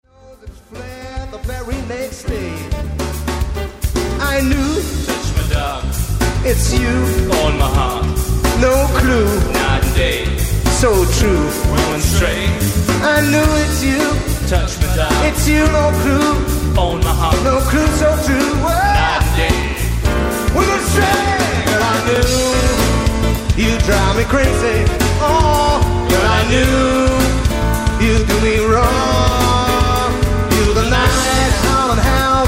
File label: whim stray unplugged